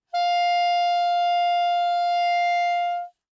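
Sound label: Soprano Saxophone non vibrato ff F4; Woodwinds; Bb Soprano Saxophone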